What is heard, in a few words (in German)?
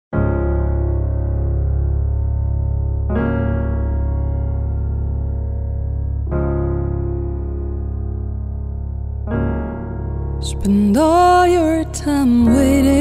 Cover, Gefühlvoller Live-Gesang; Trauersaengerin Schweiz; Würdevoll